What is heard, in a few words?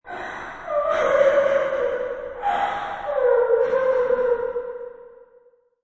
Female Ghost Crying, Eerie, ghostly female voice, crying, sulking.; Horror; Ghosts